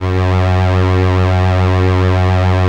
SIEL, String Machines

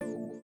VIBRA; Spectrasonics; Metamorphosis

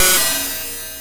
cymb, cymbals

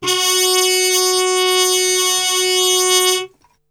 F#2, Brass Super Section TRP HARMUTE; Best Service

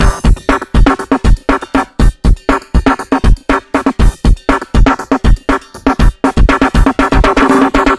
machine hip 120bpm, Downbeat Dance Grooves

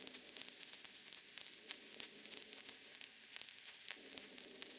Cracklature, sparse soundscape

